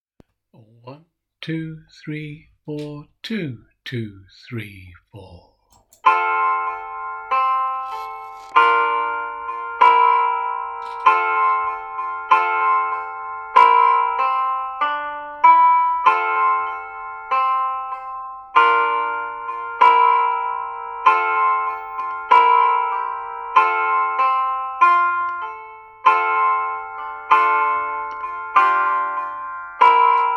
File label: Harmony Practice; Swing Low Sweet Chariot